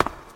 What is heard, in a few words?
cave grounds step; Golden Crayon Sounds